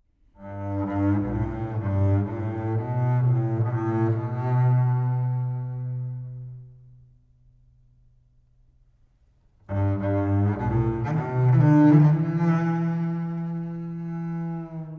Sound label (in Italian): Suono rotondo